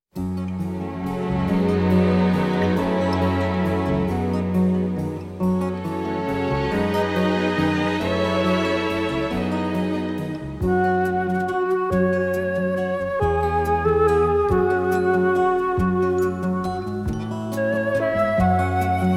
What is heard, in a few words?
Scarbrough Rain, Pan Flute